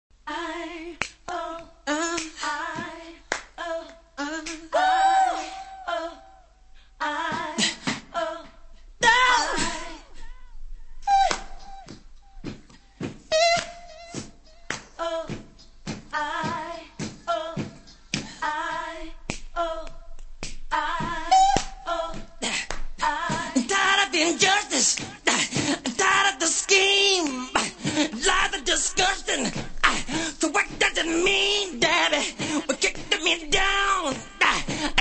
acapella

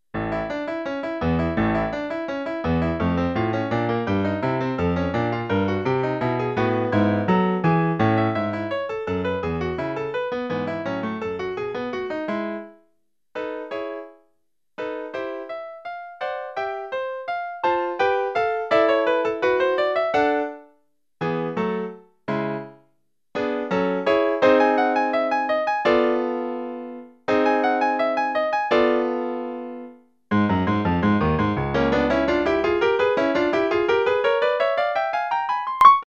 Sax Concertino; Ibert

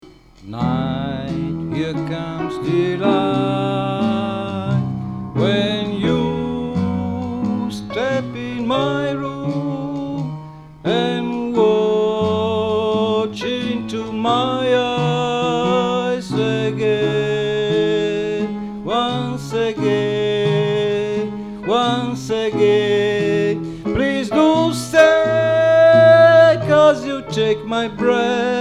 Jazz

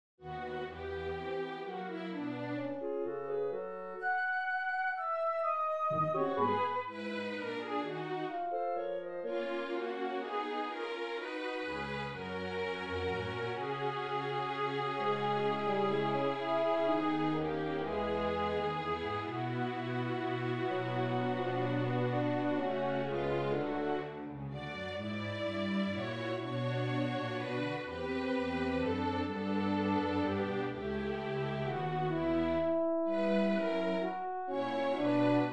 Piano Conc; Chopin; op 11 n 1

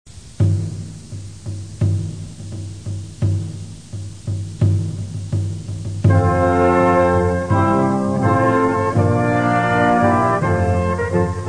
timpani

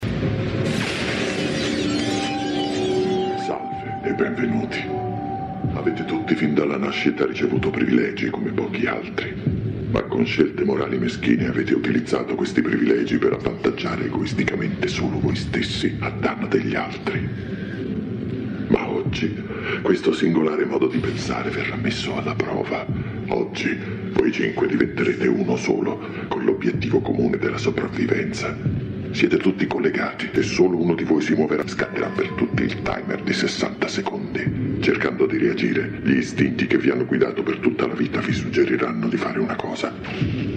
Saw

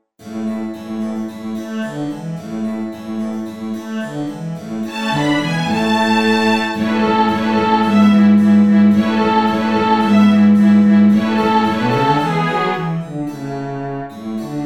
Guitar Concerto; Vivaldi; R 93

